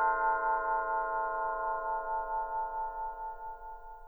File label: bells